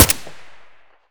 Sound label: ak74u silencer mono; STALKER; IWP